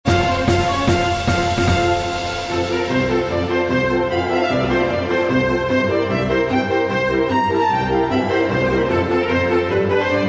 French Can-can Comedy

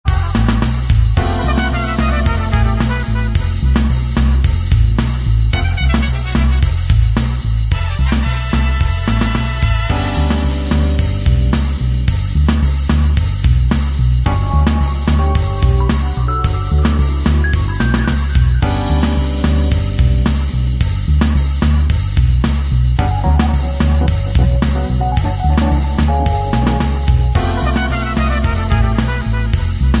Club Jazz

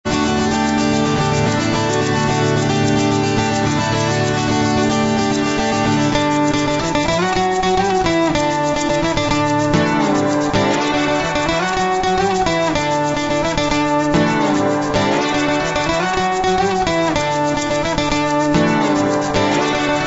Uptown Upbeat

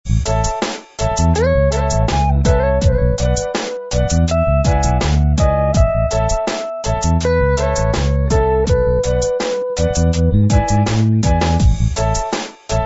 Reggae; bg-music

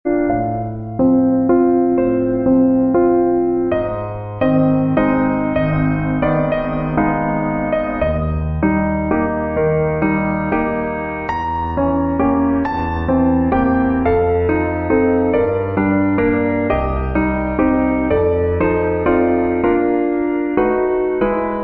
Nocturne in Eb Major

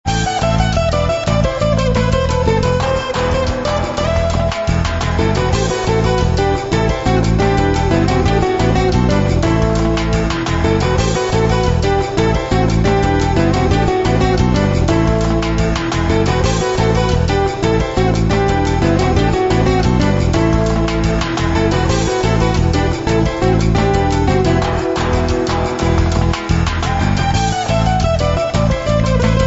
World Acoustic; bg-music

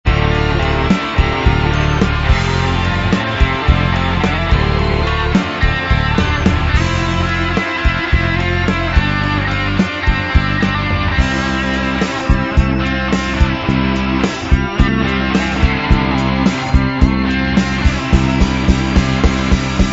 Mid Country

